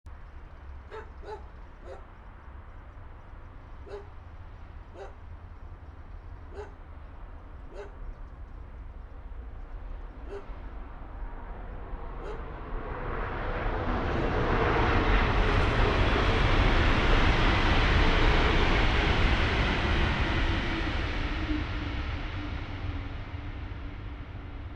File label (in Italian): Treno; Eigenmike; ComunePR; NuovaStradaF